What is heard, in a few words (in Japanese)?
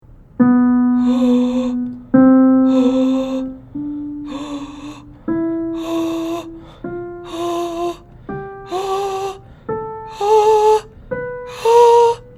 吸気発声